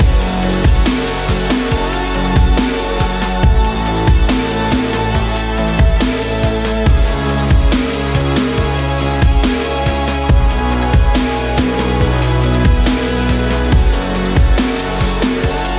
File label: extreme sports dubstep